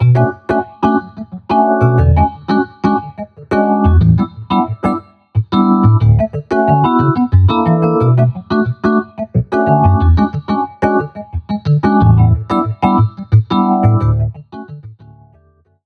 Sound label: electric dyno old sessio; electricdyno mkv 2.0